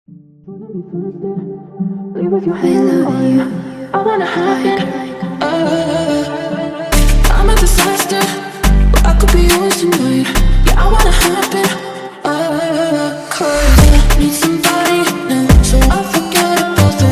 AfroDrill

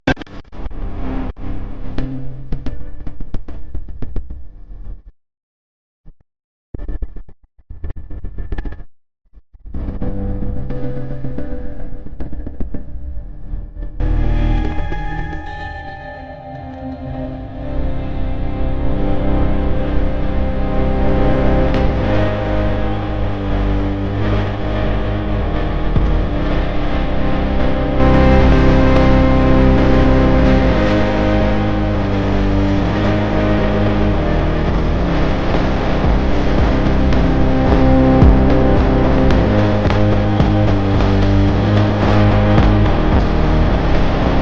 PHONK

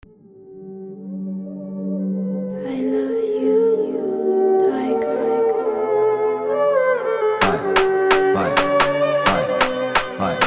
Indian Phonk

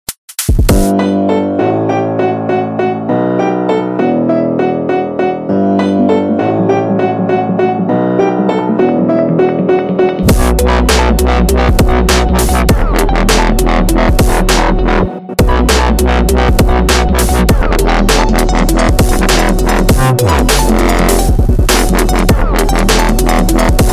tough dubstep

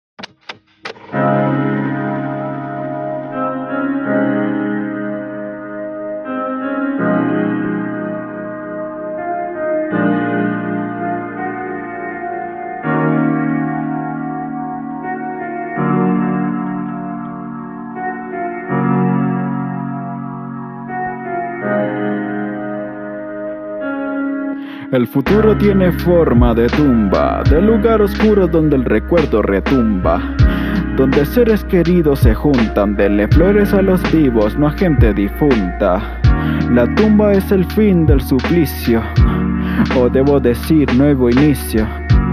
Lo-fi Hip Hop

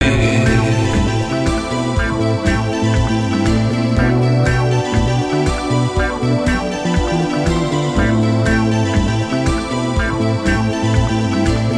Retro 80 s short french; paris trocadero; eiffel

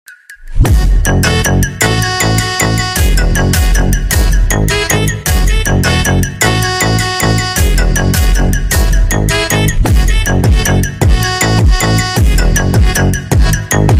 motivation phonk

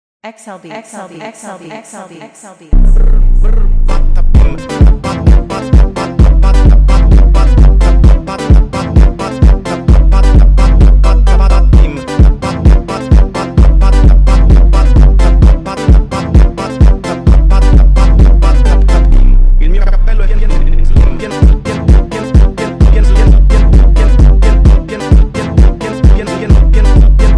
FUNK

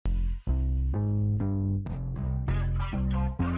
TRAP

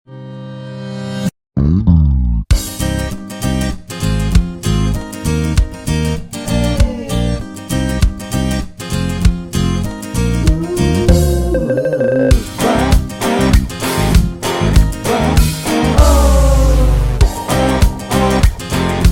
Rap Karaoke

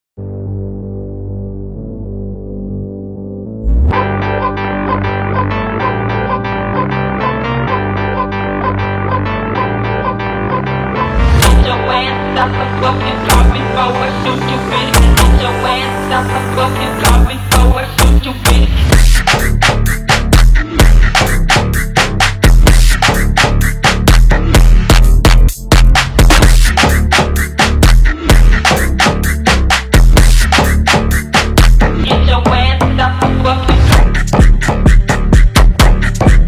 Phonk

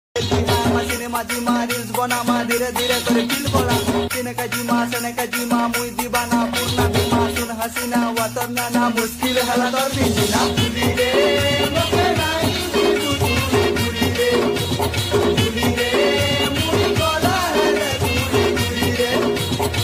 Remix Rap Version, sambalpuri rap song

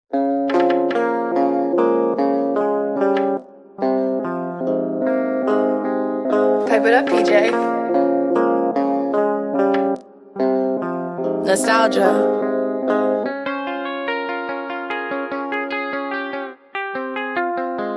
Instrumental

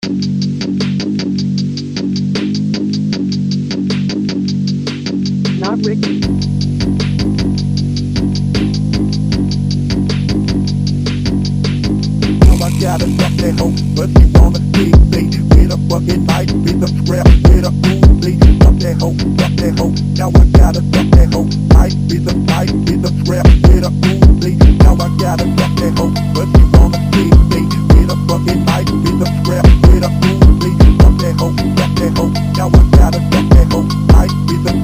MEMPHIS PHONK

